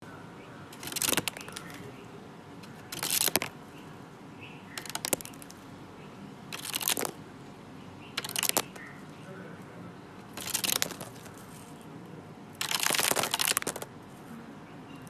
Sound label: Paper instrument